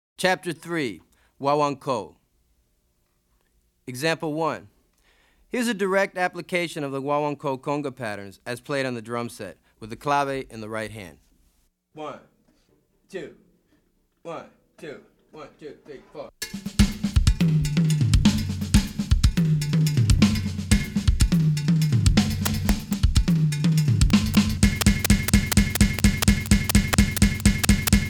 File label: Afro-Cuban Grooves For Bass And Drums